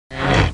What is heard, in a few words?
pick up; NETSTORM